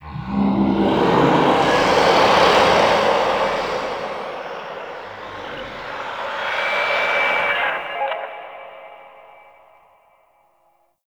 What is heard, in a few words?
Distant Infested Low G; Warframe; Ambience; NineteenNinetyNine